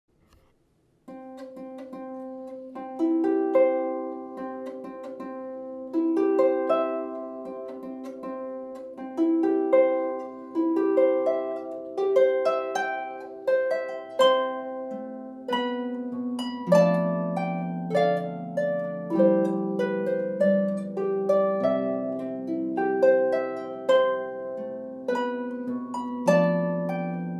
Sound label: Wedding March, Harp